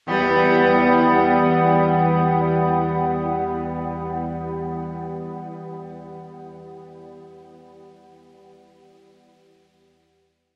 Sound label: guitar forward